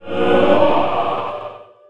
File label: redemption; spells